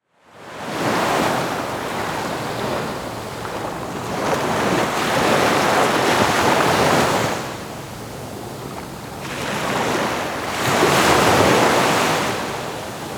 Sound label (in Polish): Szum morskich fal, odglosy natury; Odgłosy natury